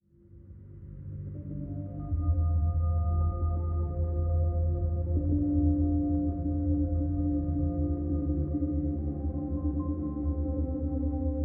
Organ and Base melodic soundscape random composed with bass; 0°N 0°W